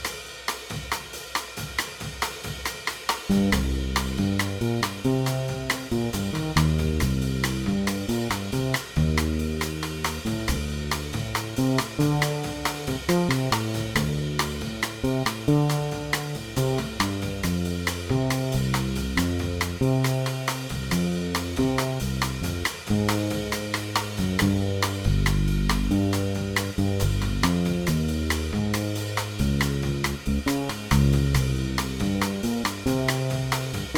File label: Minor Modal Backing Track NO COMPING